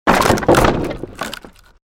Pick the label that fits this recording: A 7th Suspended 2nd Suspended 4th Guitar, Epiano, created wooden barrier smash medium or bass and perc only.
created wooden barrier smash medium